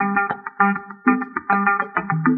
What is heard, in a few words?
guitar head to the rumble; Loops; Phonky